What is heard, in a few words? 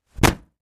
Impact Hard, rocks & dirt